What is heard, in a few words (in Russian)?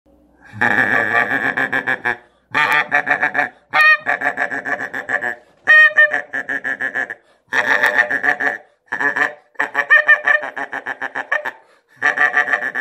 серый гусь